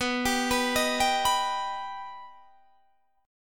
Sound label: B Augmented B down Guitar Open D